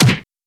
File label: Punch